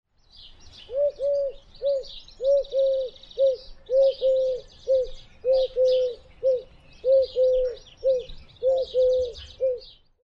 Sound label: collared dove